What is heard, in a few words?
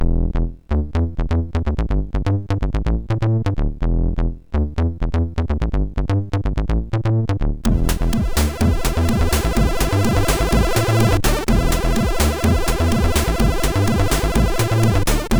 Jazzo sid MOS6581R2